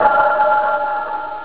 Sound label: metal, stabs